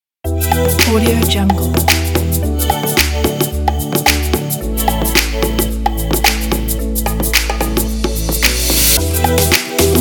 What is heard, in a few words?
tropical pop party